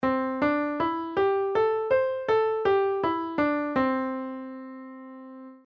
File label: pentatonic scale, piano